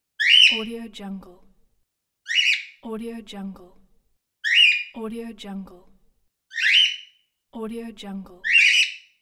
parakeet chirping

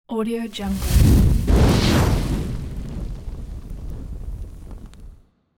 fireball whoosh

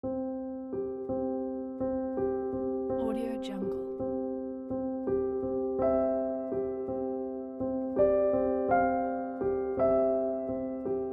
calm happy piano solo

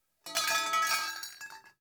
metal pipe drop

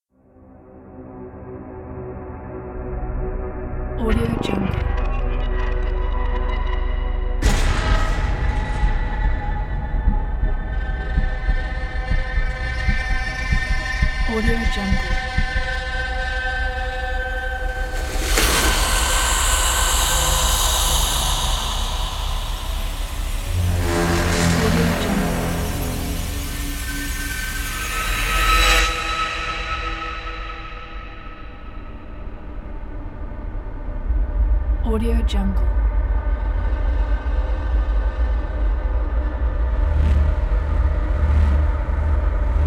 horror suspense trailer

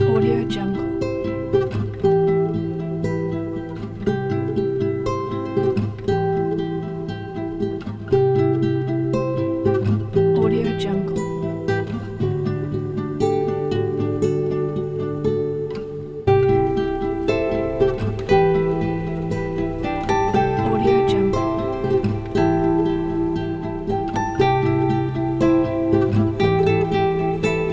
acoustic guitar ambient

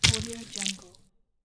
zombie bite sound

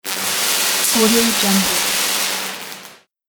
tesla coil discharge

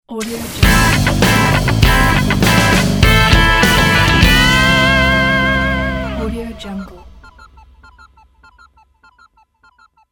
rock logo; isacgabriel

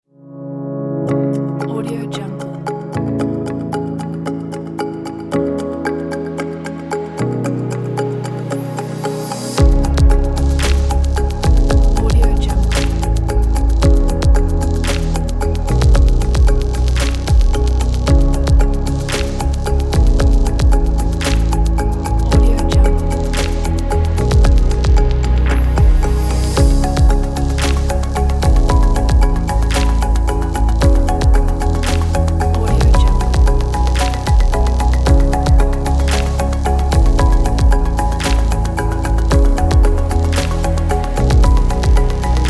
stylish chill abstract

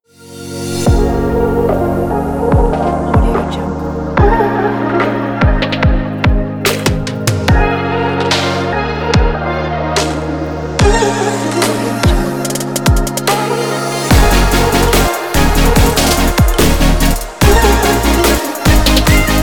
fashion future pop logo

